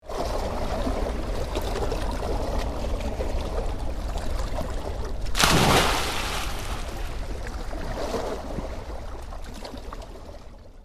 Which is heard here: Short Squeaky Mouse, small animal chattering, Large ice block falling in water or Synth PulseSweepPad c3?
Large ice block falling in water